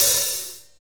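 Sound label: hi-hat, Techno Rock; Northstar; Drumscapes Roland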